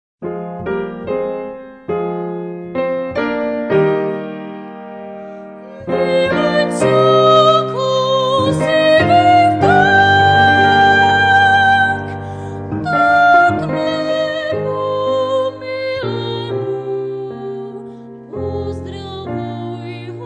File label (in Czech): zpěv, klavírní doprovod; Vítězslav Novák - Písně na slova lidové poezie moravské - Vzkázání